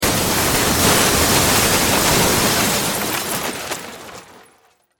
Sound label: Impact Crash Roll Large Object Heavy Short; Crashes; SFX Bible 6